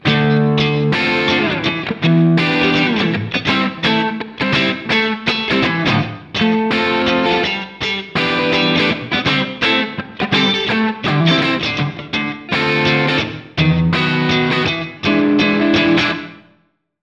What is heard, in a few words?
Groove Guitars Lg Woodroom